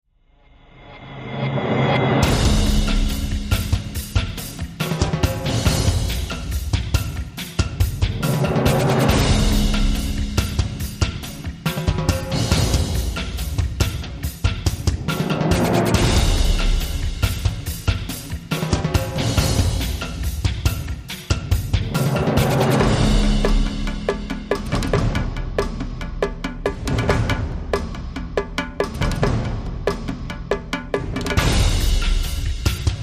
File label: Voyager Storm Drums